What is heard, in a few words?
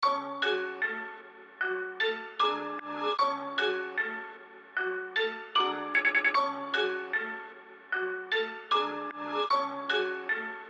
Melody